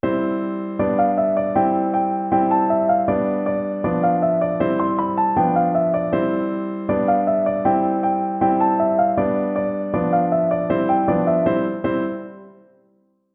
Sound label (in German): Klavierkurs Can Can